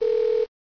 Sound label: alert sound; Adam4Adam